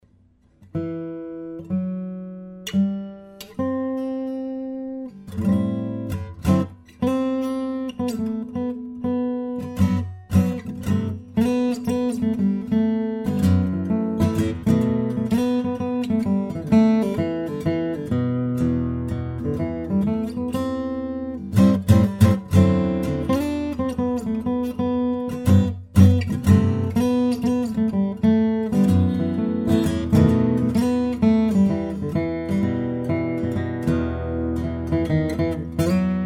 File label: guitar